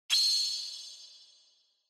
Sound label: Flash High; Onoma; オノマトペ 光る